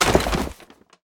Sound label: groceries paper